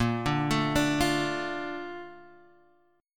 A sharp Major 7th; A#M7; arpeggiated; JGuitar

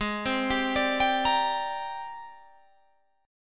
Ab Major Sharp 11th Ab, Guitar Open G; 18,17,17,19,20,20; down

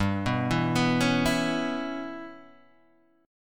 G 6th Flat 5th, Guitar, Open G

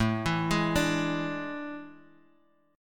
A Major 7th Suspended 4th, Guitar, Open D

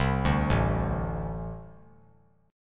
A Minor Double Flat 5th, down; arpeggiated; JGuitar

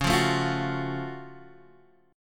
Gb Minor Major 7th Db

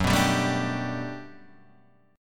G Major 9th, F sharp

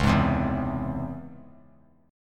Db Minor Major 7th Db; DbmM7 strummed; JGuitar

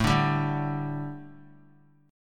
A 7th Suspended 4th, A, down; A7sus4 strummed

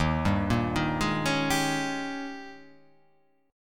D sharp 11th

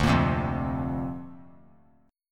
D-Minor 7th Sharp 5th D, chord; Dm7#5; strummed

